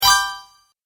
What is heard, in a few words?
Message Alert, beep